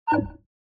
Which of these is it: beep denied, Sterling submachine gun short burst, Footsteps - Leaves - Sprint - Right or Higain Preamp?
beep denied